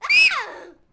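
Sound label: Settler Child Die Vox; Civ4 warlords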